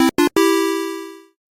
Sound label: Achieve; Tap Ninja Idle Game